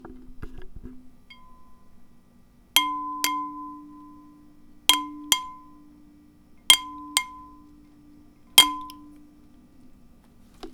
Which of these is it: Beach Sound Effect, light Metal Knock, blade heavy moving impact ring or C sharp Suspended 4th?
light Metal Knock